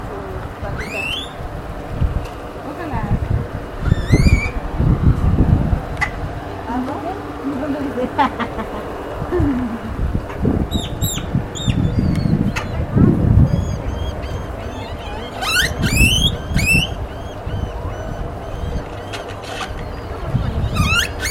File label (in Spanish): Zanate, Quiscalus mexicanus